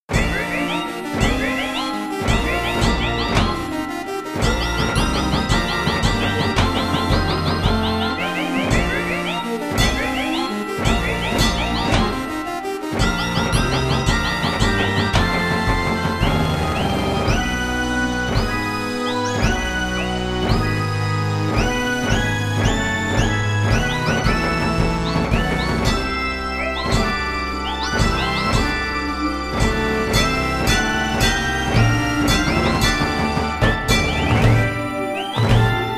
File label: moog trek